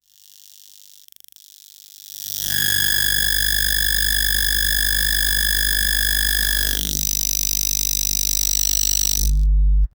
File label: electricity short circuit